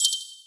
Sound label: Hover; HapticSamples; ApplicationUX; Feel; NiceVibrations